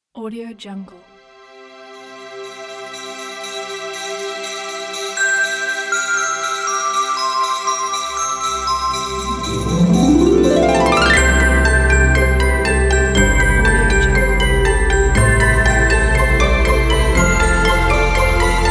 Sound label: deck the halls christmas intro